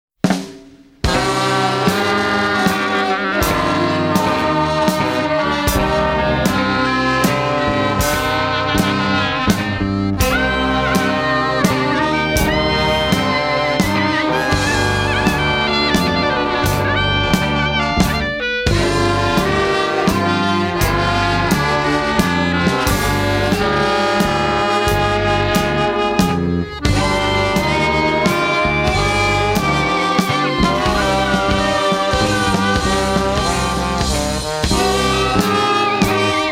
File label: Polka Lament Waltz